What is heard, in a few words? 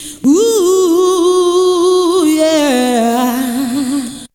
VOC; ZERO-G; Datafile